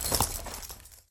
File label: heavy equipment light; taunt; player; yeti standee; source engine; tf